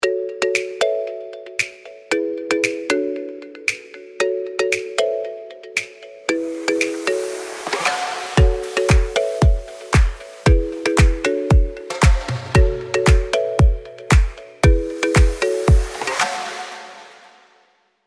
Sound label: music